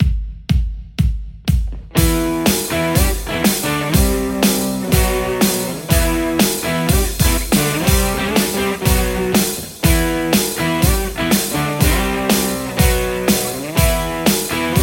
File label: strat; ronald revolutionz